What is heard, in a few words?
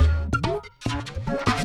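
Music Dpad Base 145; from dust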